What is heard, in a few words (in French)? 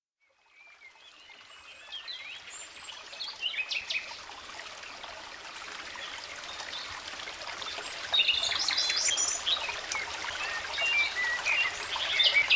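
Musique relaxante chant des oiseaux et bruit de la forêt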